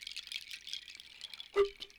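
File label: Music Village Calm Near; from dust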